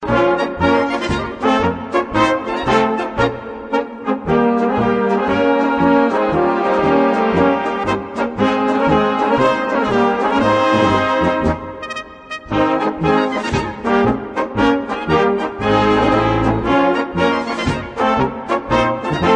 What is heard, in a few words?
Polka; Schankbursch'n Polka